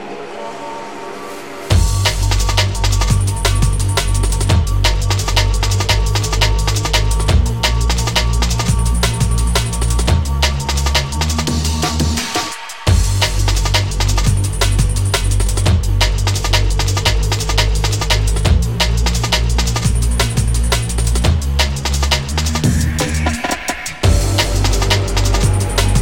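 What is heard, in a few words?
arp dancer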